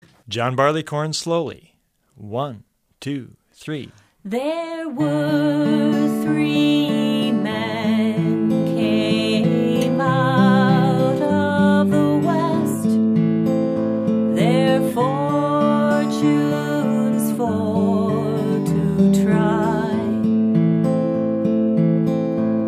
travis picking

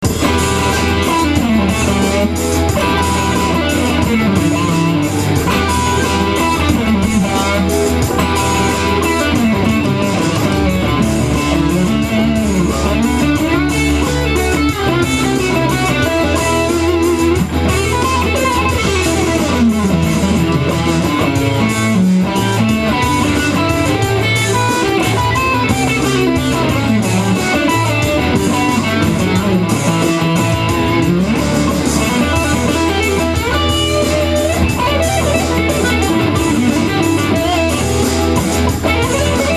intense solo

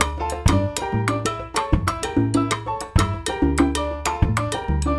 rumba

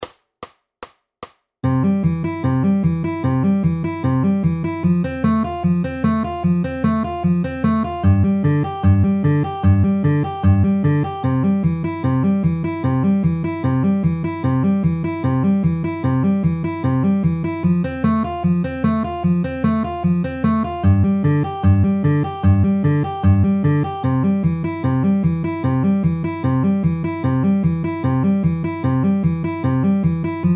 C F G C inner outer ring ring at 150 bpm

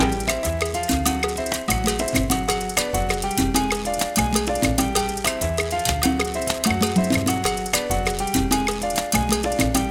mambo

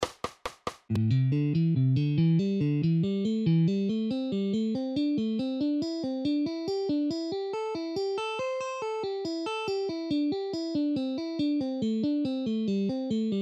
alternate picking at 40 bpm

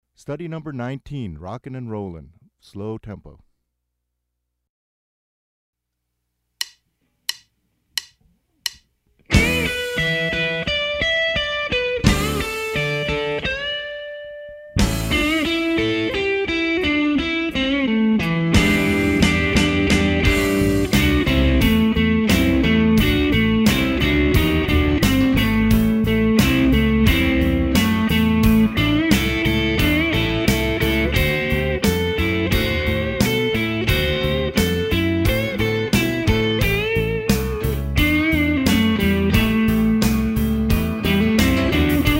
Rockin and Rollin Slow Tempo

